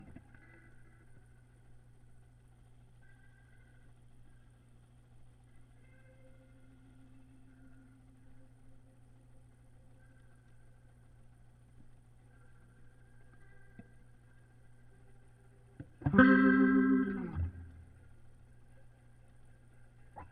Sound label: Leslie gtr